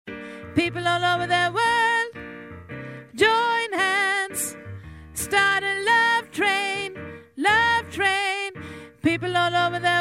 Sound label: tenor